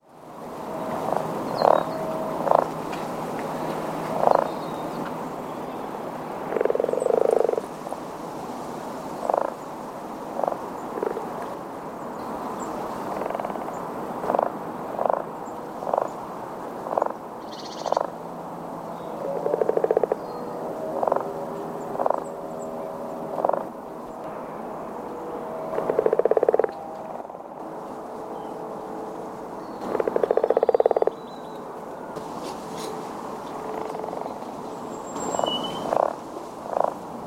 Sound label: frogs